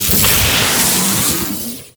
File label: lightning rod strike; Ambience; Gameplay; Warframe; Corpus Gas City; Gameplay Remaster